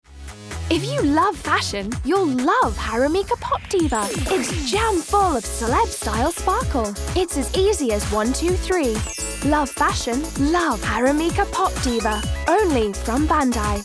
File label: pop diva